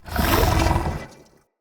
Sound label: Corrupted Battle Dog Stun End, Animals, Vocals